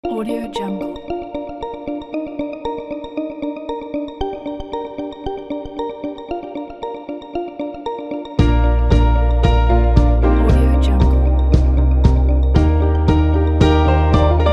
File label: positive corporate